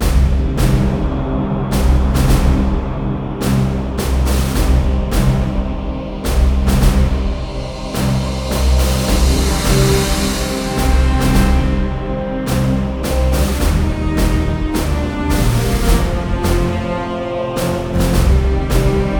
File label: celebration, music; Quake 4 Alpha; id software